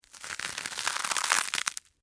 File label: Crunch Cereal Slow, Honey, Oats; Texture; BlastWave